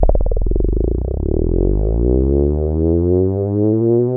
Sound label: BASS R, Drum & Bass 170 BPM; Ueberschall; Drum & Bass Flash Trackz